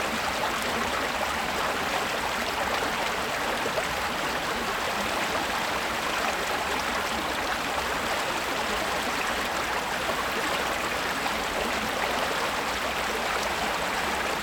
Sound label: Helfenberger Grund, Water; Detunized; JustWater; GDC Game Audio Bundle